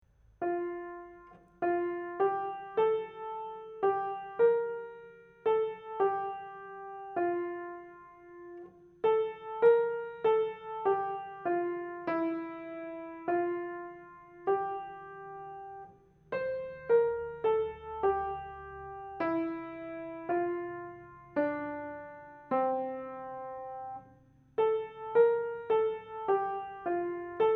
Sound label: Hymn Melody; All Mankind Fell in Adam’s Fall